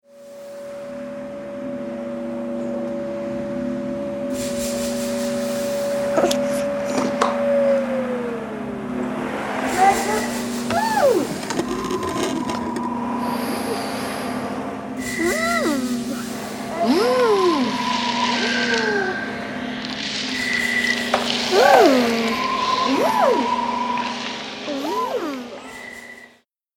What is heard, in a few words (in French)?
Aspirateur; « ON », lʼaspirateur se met en rythme, son long tuyau s'anime, s'incarne, devient guiro autant que maracas. Vouff, Vvhh !